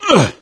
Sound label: hurt minor, gambler, survivor voice; source engine; left 4 dead 2